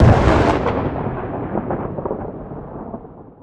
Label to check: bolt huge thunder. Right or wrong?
Right